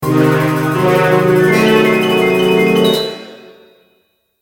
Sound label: Boss Intro; Caveblazers